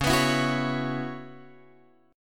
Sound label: C sharp Minor 11th, Guitar Open F; C#m11; strummed; JGuitar